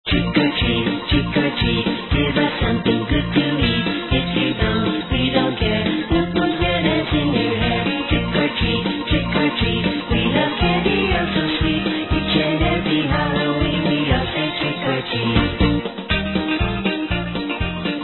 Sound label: Trick Or Treat Music, Halloween Music